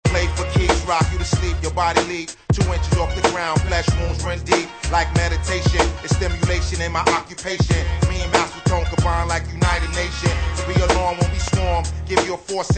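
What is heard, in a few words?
rap from middle, hip hop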